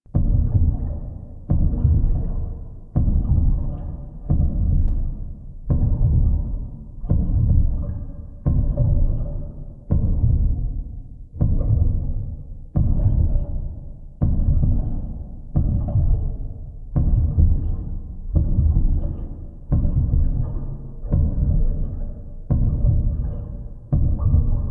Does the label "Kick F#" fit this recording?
No